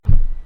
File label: Single Large Footstep; Soulforge Tower Defence